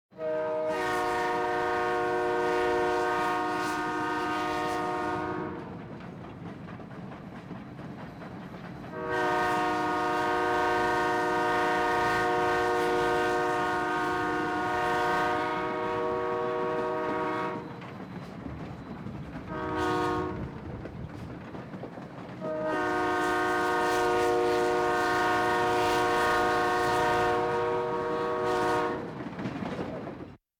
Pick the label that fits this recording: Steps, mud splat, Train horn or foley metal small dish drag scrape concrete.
Train horn